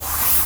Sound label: Rubbing Scratching Mur Crepi